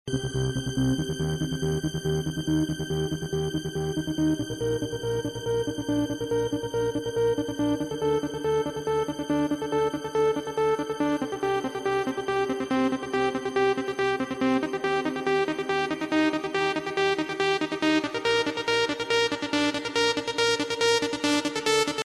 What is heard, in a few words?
trance